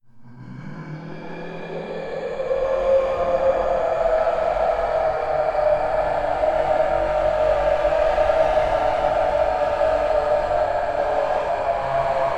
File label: Titanic Humanoid Roar